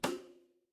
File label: cartoon bonk